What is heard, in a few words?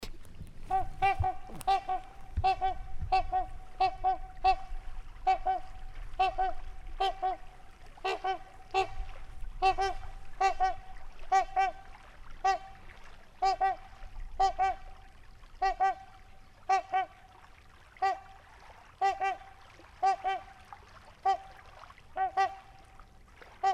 Trumpeter Swan Trumpets near Lake, Wisconsin; Wisconsin 2019